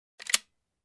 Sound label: Gun reload click